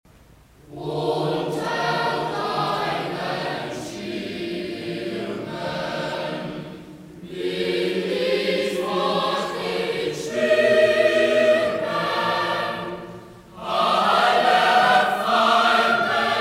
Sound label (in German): Choral; Jesu, Meine Freude